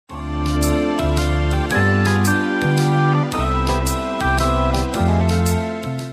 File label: slow waltz